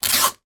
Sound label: office tape sticky rip; sound effects one